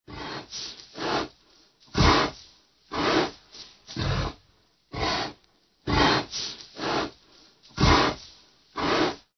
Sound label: ANIMAL CREATURE